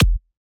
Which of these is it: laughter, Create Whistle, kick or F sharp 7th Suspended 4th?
kick